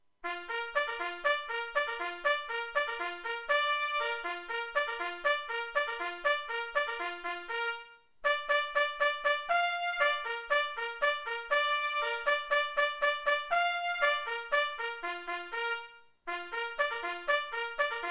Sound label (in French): reveil clairon